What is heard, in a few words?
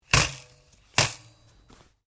RIFLE REMMINGTON700 7.62MM BIPOD UP; A selection of domesttic firearms; Rifles; Guns